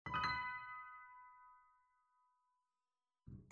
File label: Accord 2sec Pianoteq, piano